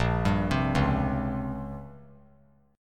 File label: Bb Minor 6th Add 9th Bb down; arpeggiated; JGuitar